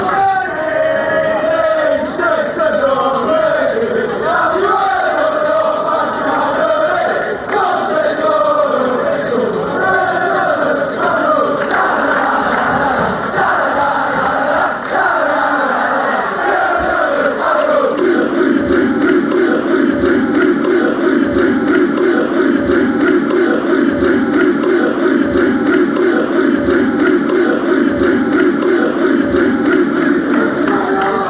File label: gelderd aggro, fanchants